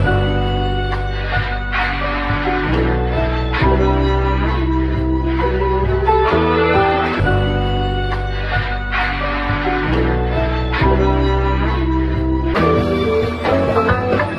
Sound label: keys piano voice ha yeah; Loops; Phonky